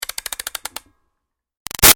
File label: Toy Music Box Wind Up Slow; Music Box , Turn , Wind Up , Slow; Wind Up Toys